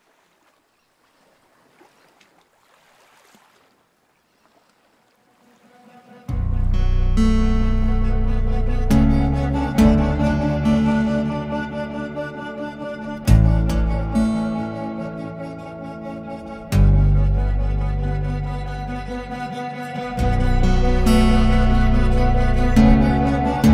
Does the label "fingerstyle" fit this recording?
Yes